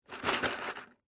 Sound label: Game Box Shake, Board; Sports; Monopoly; Board Game Sound Effects; BlastWave